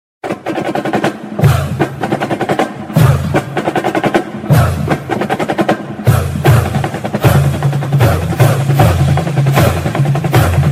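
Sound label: Drummers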